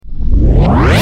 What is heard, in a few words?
Time Shift Start; Galaxy on Fire 2 HD